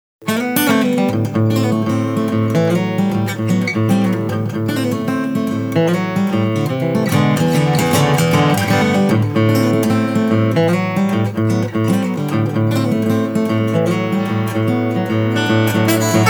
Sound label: Intro Guitar Mic